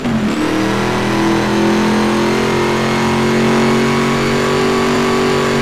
fourth cruise, vehicles

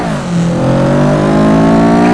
2nd gear, vehicles; blista

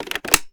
reload empty mag in, weapons; gmod; viper

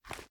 reload empty fast start; gmod; viper

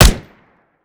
weap famas fire, weapons; gmod; viper

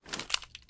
fast reload raise; gmod

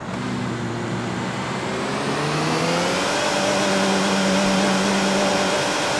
first gear reverse, vehicles; landstalker

